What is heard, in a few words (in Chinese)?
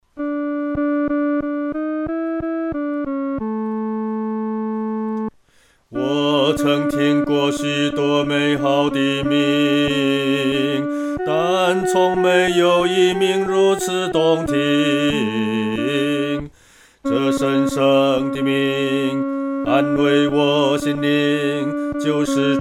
独唱 第二声